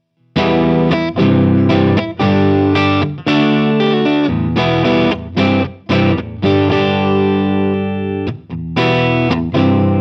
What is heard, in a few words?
Friedman Clean